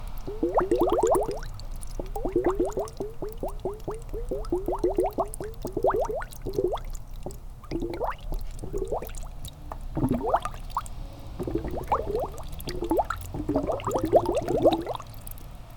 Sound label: bursts of bubbles